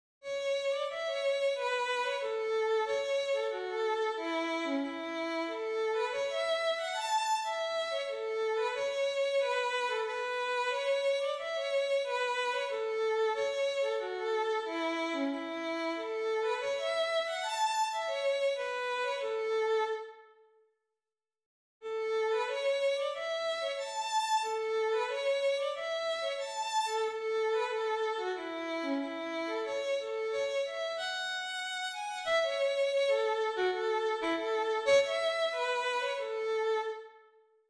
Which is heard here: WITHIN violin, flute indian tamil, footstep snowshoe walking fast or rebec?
WITHIN violin